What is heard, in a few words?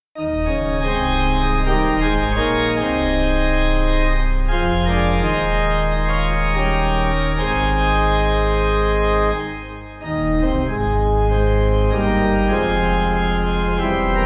Pipe